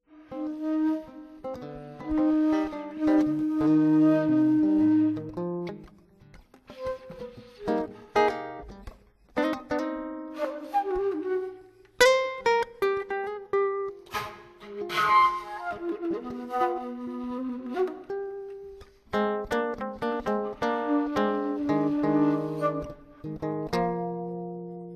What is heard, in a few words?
FLUTE SKETCHES